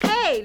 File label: CHANT HEY